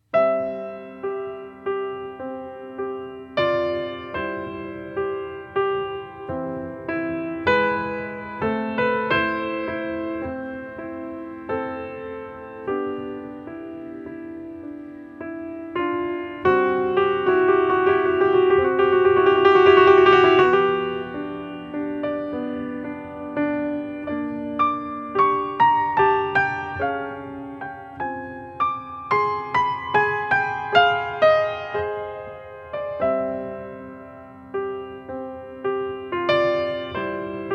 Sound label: little piano